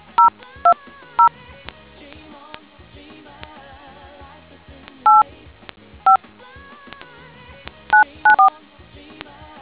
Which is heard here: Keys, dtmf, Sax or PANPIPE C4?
dtmf